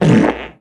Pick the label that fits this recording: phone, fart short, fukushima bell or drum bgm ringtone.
fart short